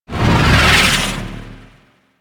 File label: storm end; Warframe; Warframes; Ninja